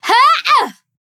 Mage Grunt Attack; Paladins

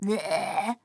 tongue; Skye; paladins

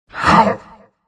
knight pain; Chaos Knight

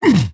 axe attack effort small

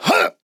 Grunt Attack; Buck Triggerman

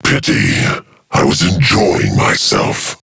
Death

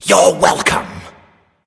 Laughing Skull; Bakasura

